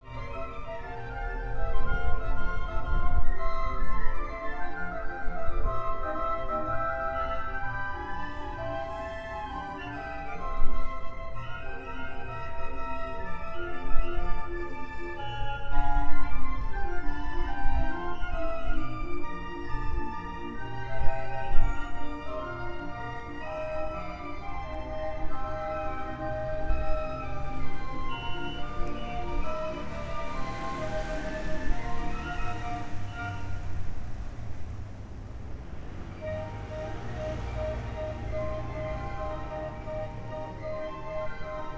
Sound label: Organ Grinder; Paris